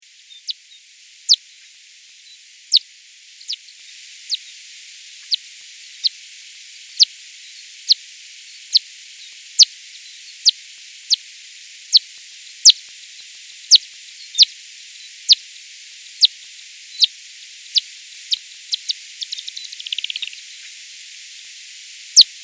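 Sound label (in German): Wasserfledermaus Ortungsruf verlangsamt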